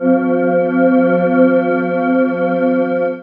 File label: SWIRL; X-Static Goldmine 1